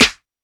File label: Snare, drums; Ableton Live 12 Lite Core Library